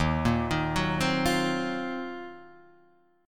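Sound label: E flat Minor 6th Add 9th, Guitar, Open D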